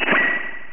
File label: spinout